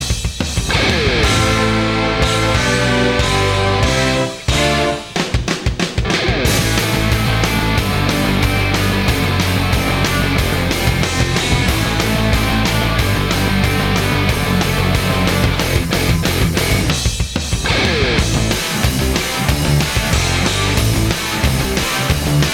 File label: Instrumental Version 2; Power Rangers Lightspeed Rescue